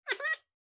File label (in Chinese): 豹猫待机声